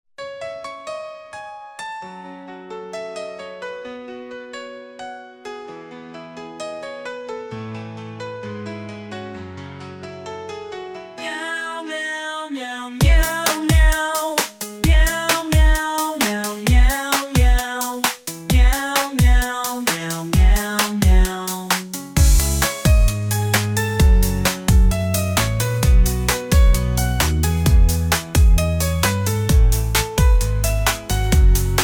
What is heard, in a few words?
Meow Synthwaves; MusicBoxAI